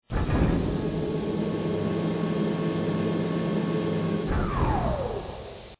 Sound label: elevator; Flashpoint Ultimate 13; thelastnight